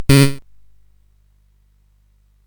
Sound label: SFX; Narco Police